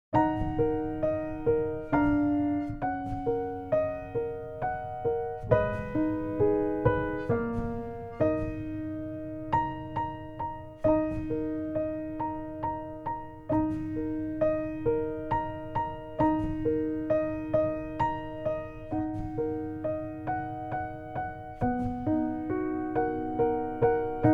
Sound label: instrumental